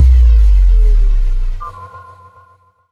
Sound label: D+B; Best Service; XXL Production Effects; DANCE; Sample CDs